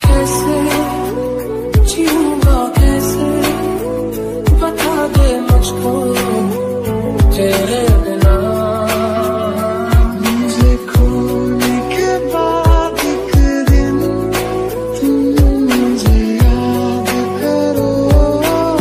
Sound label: love music ringtone, bollywood